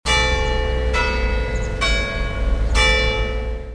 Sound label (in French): Cloche de pâques